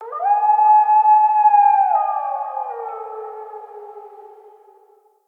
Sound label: Wolf Cub Trapped Call Distant; Vocals; Animals